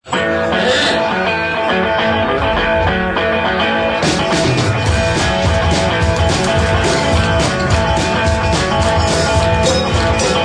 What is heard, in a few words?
blues